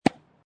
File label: Tennis Hit Ball with Racket Close; Lynne Music